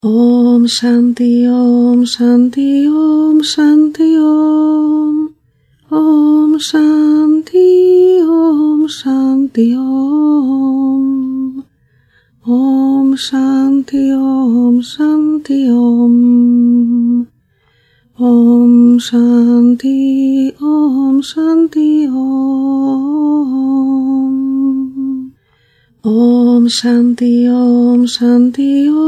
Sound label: Om šanti om